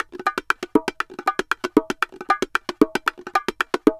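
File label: BONGO SALSA, salsa drums and percussion; Best Service ProSamples vol.14; AKAI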